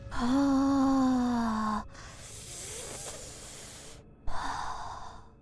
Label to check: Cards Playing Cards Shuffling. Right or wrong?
Wrong